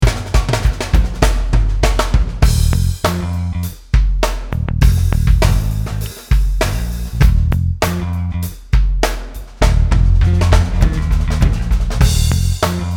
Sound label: Backbeat 98; Sphincter